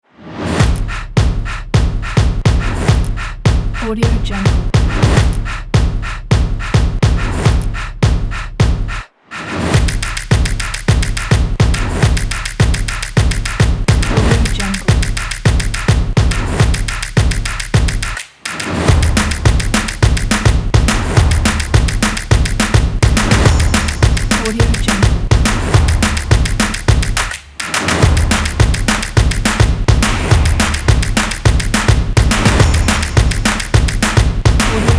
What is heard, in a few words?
the percussion